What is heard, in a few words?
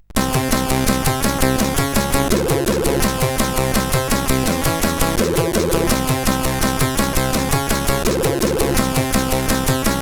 Rock, sid CSG8580R5